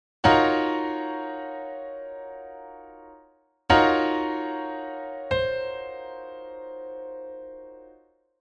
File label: upper chord tones